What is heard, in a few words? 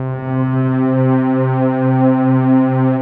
rich mellow, Rich Pad